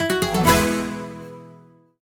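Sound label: small win, slot machine; eldorado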